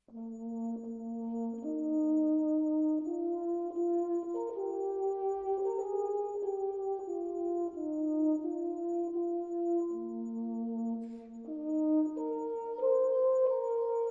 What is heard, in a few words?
Horn; Ukázky hornových koncertů a fanfár; canon